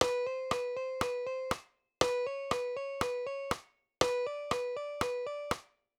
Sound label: Hammer ons